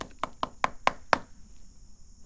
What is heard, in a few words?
perc